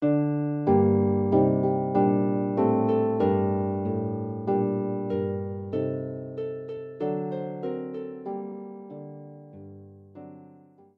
Welsh Traditional Tunes sospan fach Little saucepan, harp